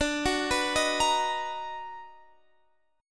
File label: B Major 7th D; Bmaj7/D arpeggiated; JGuitar